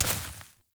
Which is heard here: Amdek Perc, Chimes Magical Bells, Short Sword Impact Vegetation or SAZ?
Short Sword Impact Vegetation